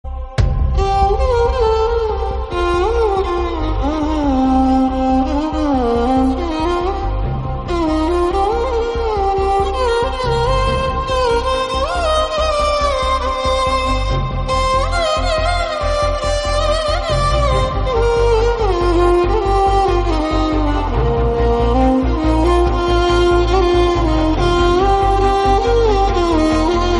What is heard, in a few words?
Theme, Malayalam Ringtone